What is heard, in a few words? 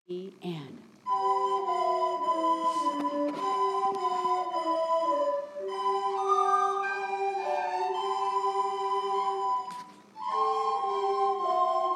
jingle bells